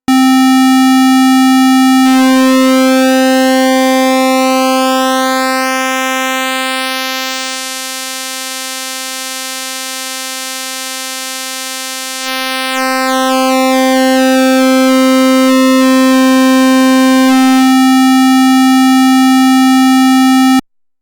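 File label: Roland SH-101 Pulse width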